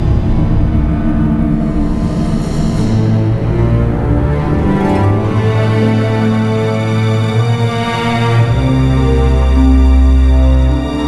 Music Movie; legend of the guardians the owls of ga hoole 2010 nds gamerip

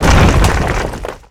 door; Shin Megami Tensei IV Apocalypse; 3DS

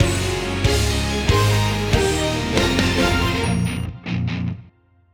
Reward music

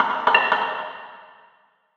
Wood Spoon Pot

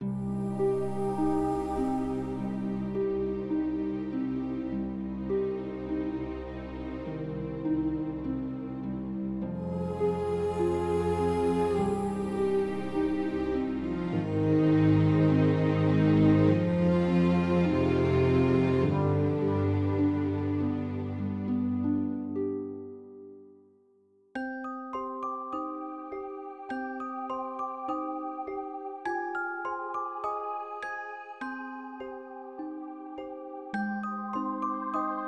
Exploration Loop; Shipwreck Beach; Curse of the Sea Rats

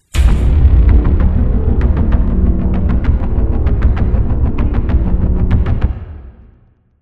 Trailer Hits Melody; Five Nights at Ronald's

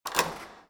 unlock; Cookie Jam

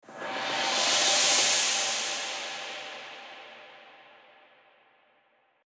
crash transition

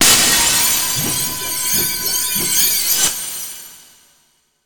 STAGE CLEAR

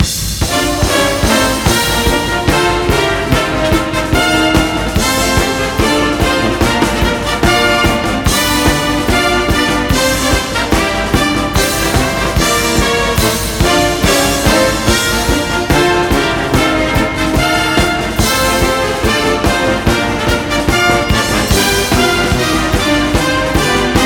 Fight Song

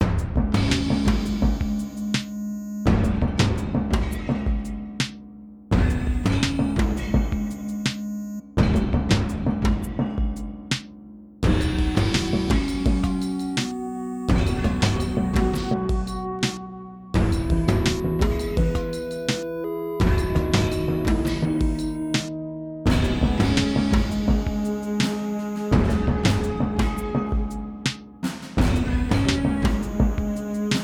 Town Music Loop

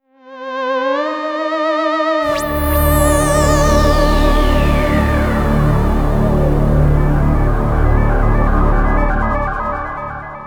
Round Start; Moon

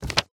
Paper Hit; hello neighbor pre alpha alpha 1

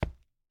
Footsteps Ruins; Main Character

